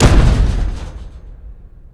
shield; NanoFXv2; ExplosionFX; ExpShield

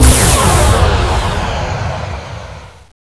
Tractor Inversion

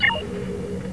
hail; Defiant

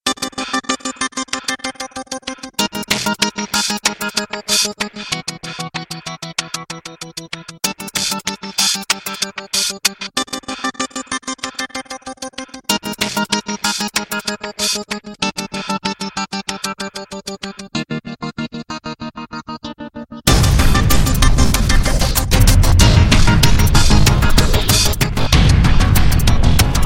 Combat Music; DS9FX

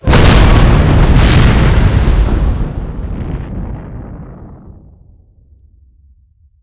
large, Explosion; NanoFXv2; ExplosionFX; ExpLarge

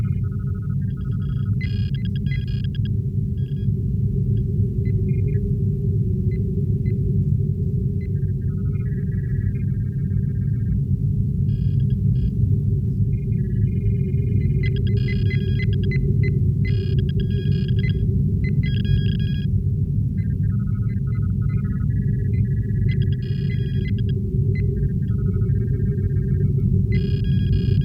ambience; Defiant

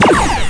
cannon; Weapons

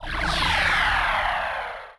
romulan phaser; Weapons

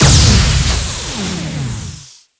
Ion Torpedo, Weapons

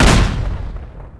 shield; NanoFXv2; ExplosionFX; ExpShield

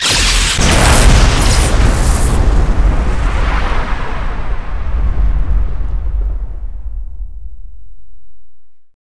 nova, Explosion; NanoFXv2; ExplosionFX; ExpNova

